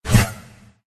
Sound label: attack contact middle